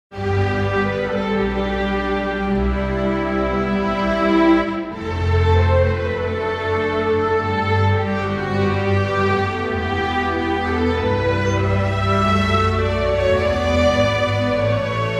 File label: orch